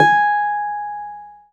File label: BD G#4; Keyboards; Model-C; Ableton Foundation FreePacks